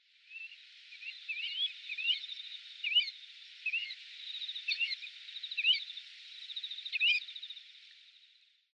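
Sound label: Pacific Golden Plover; hyogo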